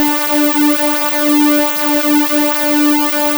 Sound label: RAVE SYN D5